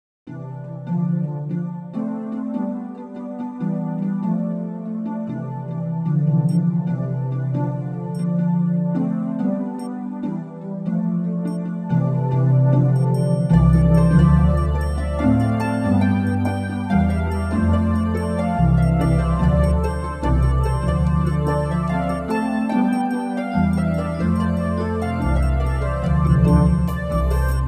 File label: Ambient